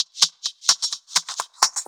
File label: FX Loop 128 BPM, House